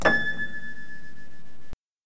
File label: Piano